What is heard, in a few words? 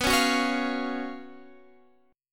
Gb Major 7th Suspended 4th B, guitar